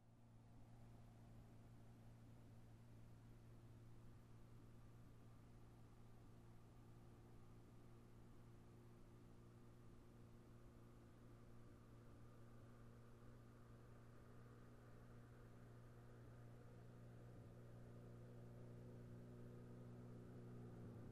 Motorcycle passes by

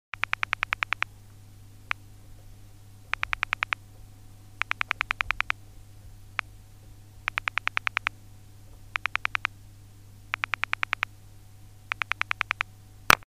Pulse dial sounds in the handset; telephone

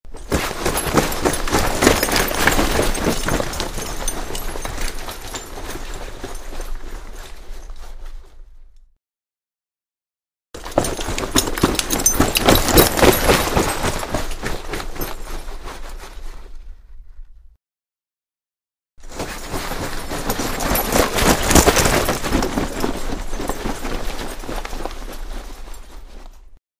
Running, rustling, metallic ringing; steps

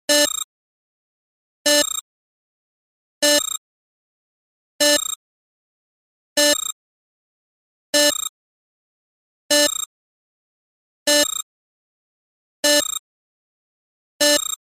Computer interface alarm; radio signals

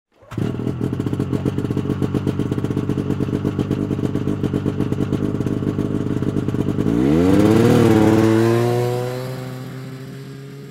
Snowmobile leaves

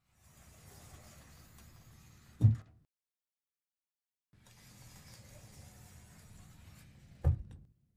cupboar door opens closes

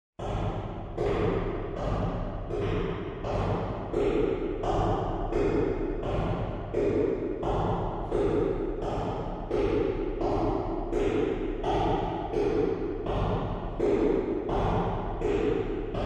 Footsteps in an empty hallway

